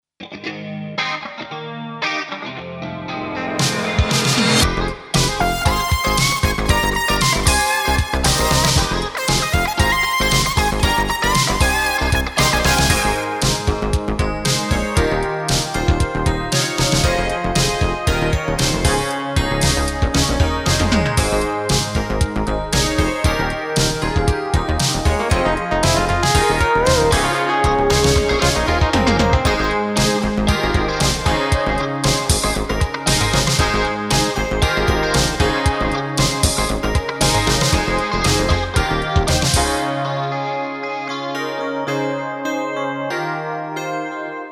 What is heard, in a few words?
80s pop; AVGN